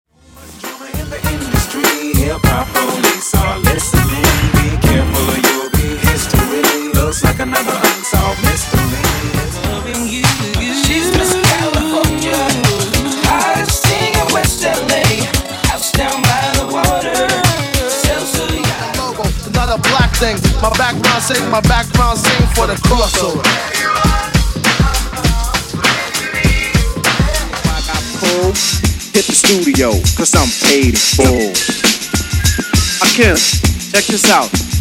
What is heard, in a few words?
Party Hip Hop Old School Megamix, 100 Bpm